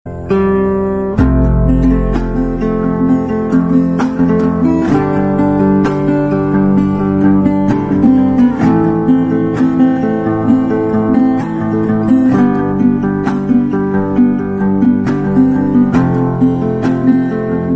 Guitar Cover Ringtone; Pubg

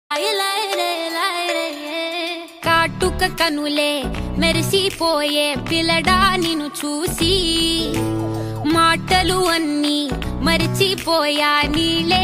Tamil Ringtone

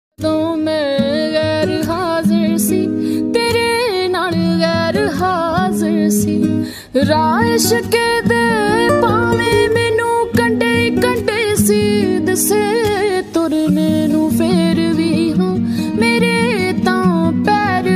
Desi Punjabi Ringtone

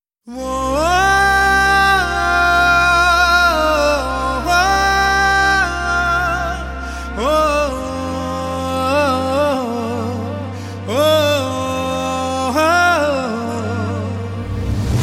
Humming, Telugu

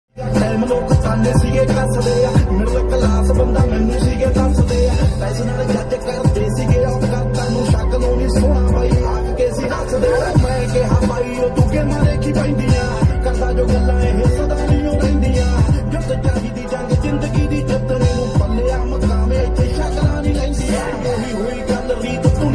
Desi Punjabi Ringtone

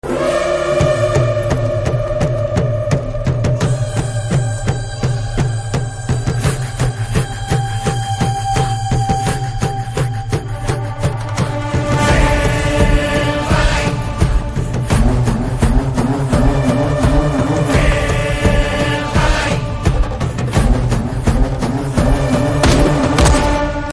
Trailer BGM Telugu Ringtone; Viraata Parvam

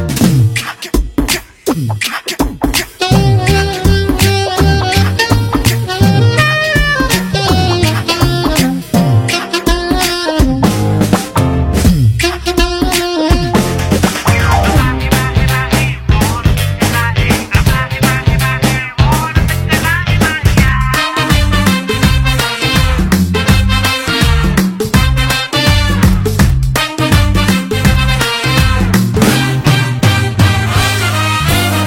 BGM Telugu Ringtone

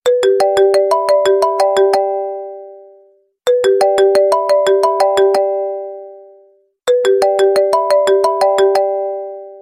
Iphone 4 Original Ringtone

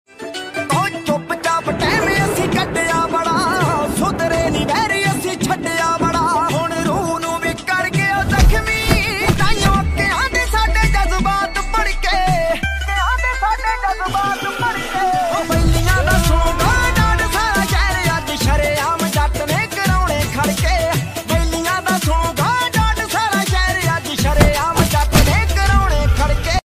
Punjabi Tune Ringtone

